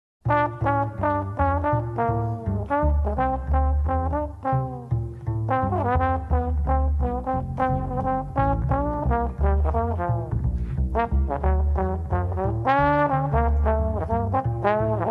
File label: Angry, Dixieland jazz band